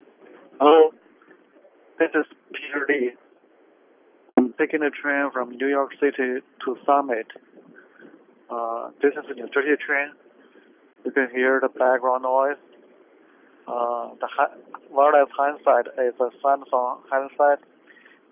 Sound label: train, noise reduction mobile phone